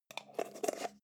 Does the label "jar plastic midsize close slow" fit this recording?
Yes